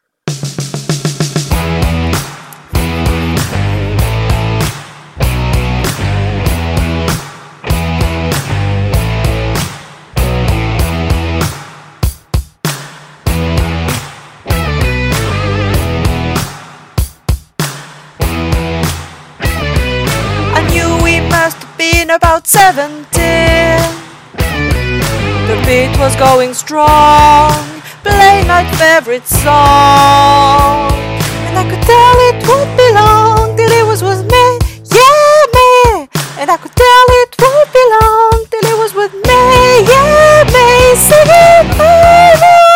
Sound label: soprano